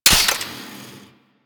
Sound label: Spark Rifle Mech; Grineer